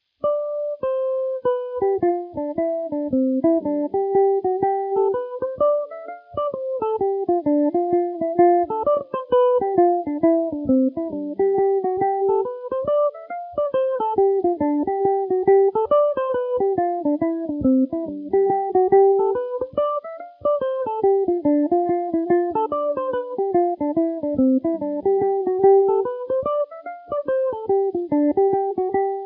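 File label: G mixolydian, Irish mandolin; girl that broke my heart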